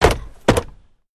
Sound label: Carriage Footplates